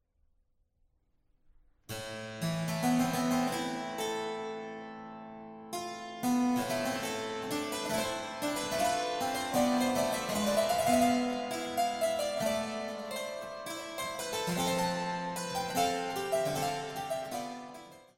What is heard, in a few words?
Sonata a Cimbale Solo; Sybrandt van Noordt